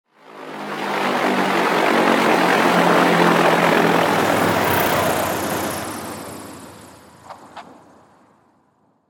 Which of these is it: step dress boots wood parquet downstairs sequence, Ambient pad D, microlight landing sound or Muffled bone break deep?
microlight landing sound